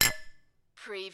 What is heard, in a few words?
Glass clink, two thick glasses clinking together